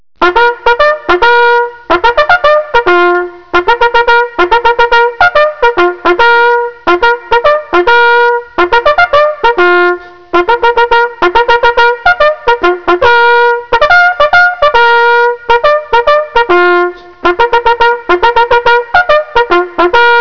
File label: Bugle calls; American Civil War